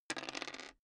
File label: dice roll table